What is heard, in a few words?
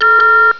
warning; xshipwars